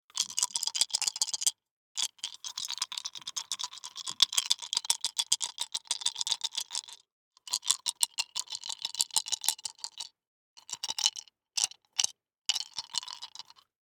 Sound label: DICE in Two Hands, Shake, Four Dice; Samples; ArticulatedSounds; DICE Sfx Party (Extended)